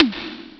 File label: m1 etom hi; ST-XX Amiga Soundtracker Sample Packs